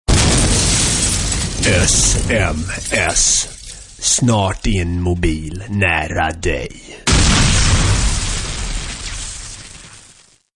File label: SMS; Ringtones